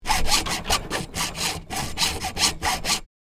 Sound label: Sawing plastic pipe handsaw squeaky